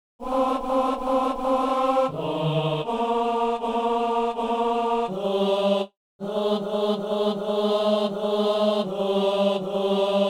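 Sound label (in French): ténor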